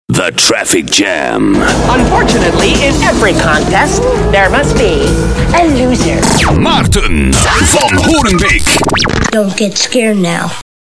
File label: jingle